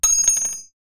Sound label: cart